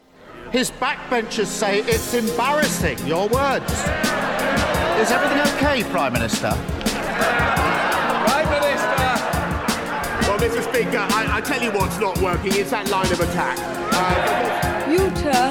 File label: PODCAST JINGLE; INDEPENDENT PODCAST AWARDS JINGLE